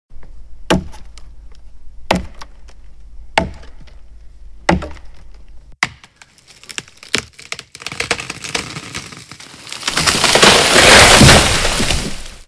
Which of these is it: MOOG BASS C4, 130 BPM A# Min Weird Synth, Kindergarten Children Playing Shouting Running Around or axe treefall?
axe treefall